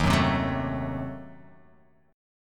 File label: D sharp Diminished 7th; chordshape; x,6,4,5,6,4,x,x